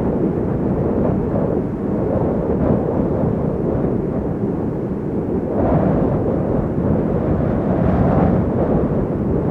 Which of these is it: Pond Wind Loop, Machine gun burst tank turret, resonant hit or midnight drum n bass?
Pond Wind Loop